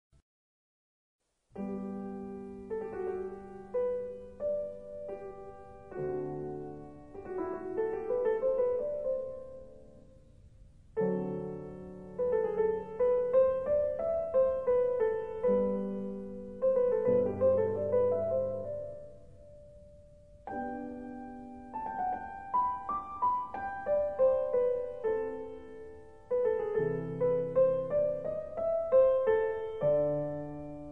Соната ми минор 2 ч. основная тема

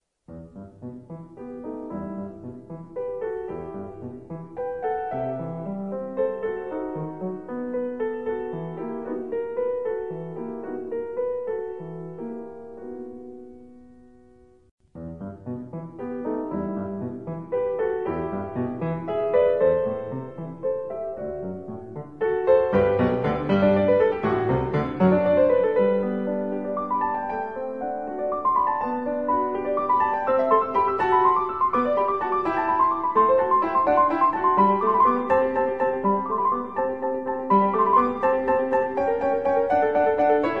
Соната ми минор 1 ч. главная тема

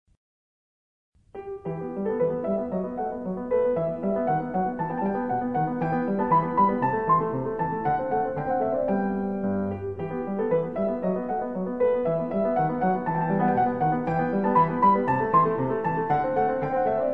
Соната ми минор 3 ч. рефрен

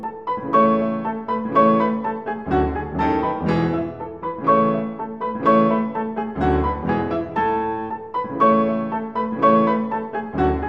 соната ля мажор 3 часть 1 рефрен; Моцарт

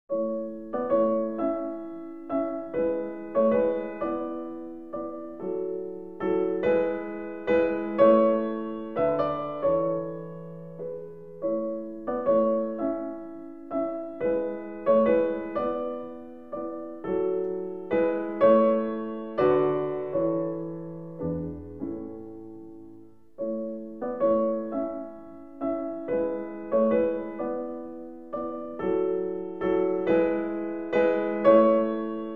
Моцарт — Соната Ля мажор. 1 часть. Тема

